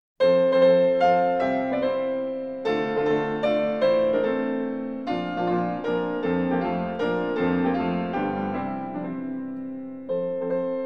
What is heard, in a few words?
Mazurka F-dur; Mazurka 50